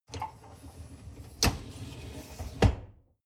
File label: dishwasher upper tray draw in